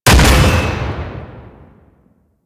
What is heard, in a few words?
Flak Cannon Shot; Grineer